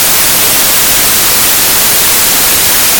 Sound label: noise white